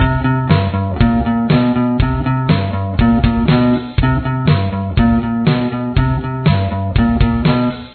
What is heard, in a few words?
bass